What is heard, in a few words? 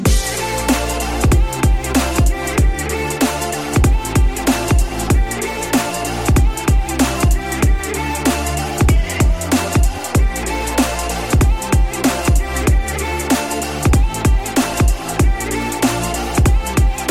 Huawei Y9 Ringtone